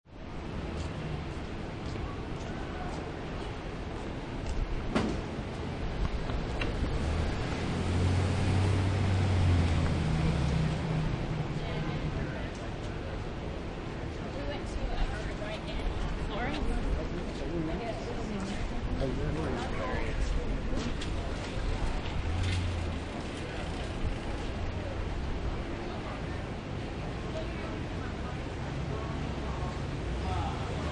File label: Light City Traffic, Little Italy; NYC; Mulberry Street. Away from the heavy traffic. Few people walking by and talking; City Sound Effects